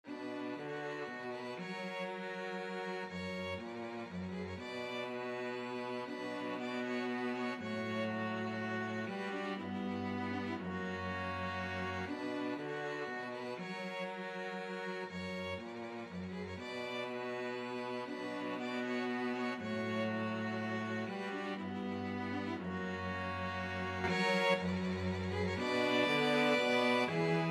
concerto grosso, string quartet; corelli; op 6 no 8 4

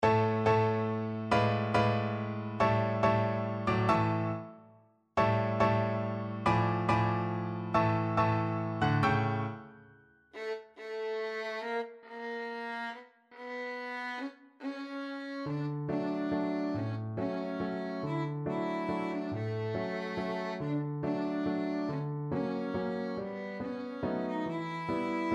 waltz violin; black swan

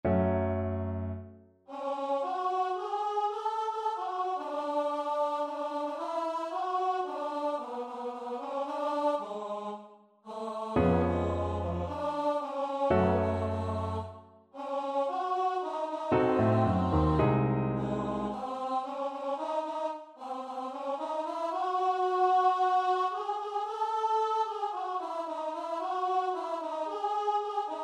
st matthew passion, choir; bach

